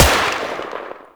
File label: Skiff Rifle Fire; Eidolon